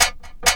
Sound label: techno, PERC; Techno Trance Essentials